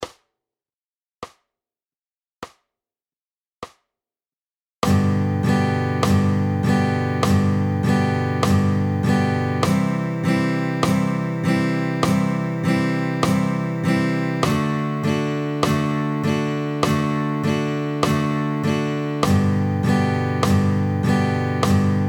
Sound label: 50 BPM, 8th Note Down Up; oefening 2; upstrokes op de "&" van de maat